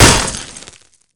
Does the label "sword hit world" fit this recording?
Yes